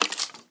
step, skeleton; minecraft; mob

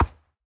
soft, horse; minecraft; mob

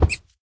hurt, rabbit; minecraft; mob

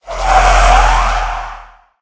idle, wither; minecraft; mob

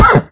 hit, horse; minecraft

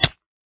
hit, damage; eaglercraft 1.5

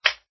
small, magmacube; ayuncraft 1.5; mob

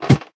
ladder, step; minecraft

